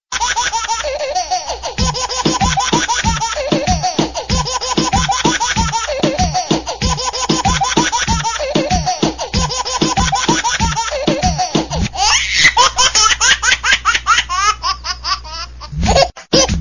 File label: baby sound ringtone